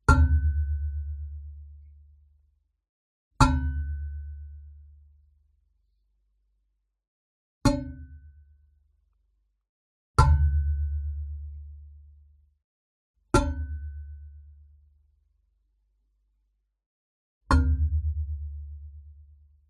contact mic hit metal acoustic stand

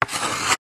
office chalk draw line on blackboard

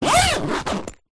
household plastic pillow or duvet bag zipper

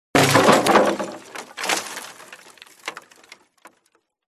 crashes wood; Audio Mangler

